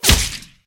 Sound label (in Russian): svist proletayushej mimo puli